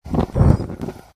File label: arrowlight, ambient; Source Engine; tf